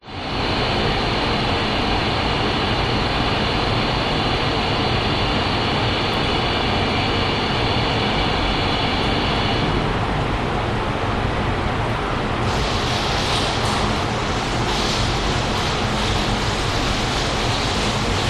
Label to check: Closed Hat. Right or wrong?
Wrong